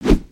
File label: Pistol Monkey Flip; gnarled forest